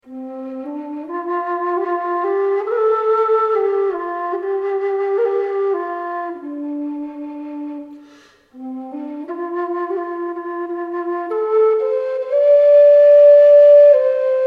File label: low c whistle